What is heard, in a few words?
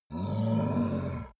yeti growl warning tense; kreaturen